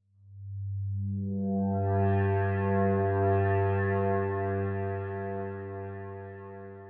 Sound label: Metal Swell G-1, Dark Swelling Synth